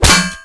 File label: Physics Metal Solid Impact Bullet; Counter-Strike: Global Offensive